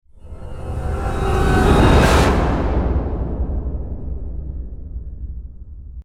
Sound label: scary winds